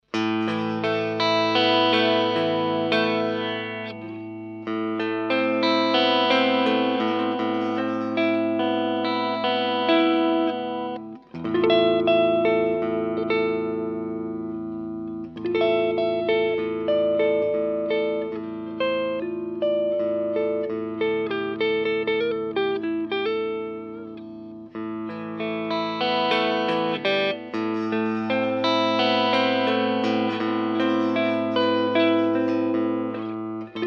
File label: Ibanez MH 400 bridge clean